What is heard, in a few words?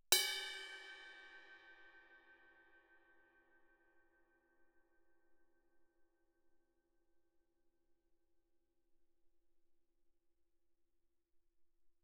crash stick bell ff, suspended cymbals; Percussion